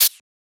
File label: Shaker; FLPRO